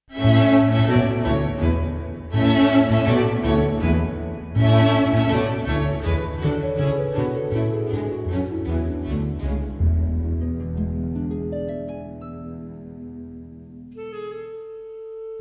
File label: Waltz for a Romance (In a Major); Lagaan (2001)